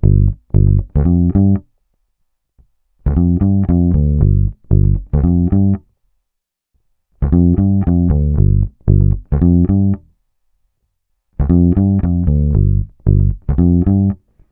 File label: Bass loop 115 Gm, Electric Bass Loops Direct; Slate Digital BASSHOLE Sample Pack